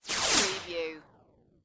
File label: Laser Hit Reverb